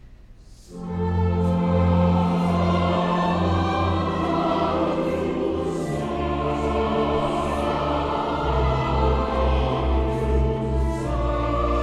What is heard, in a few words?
Chancel Choir Missa brevis in F, K. 192 IV. Sanctus; W.A. Mozart